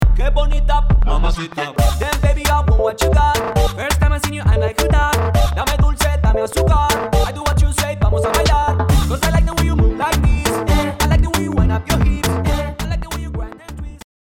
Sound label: BPM135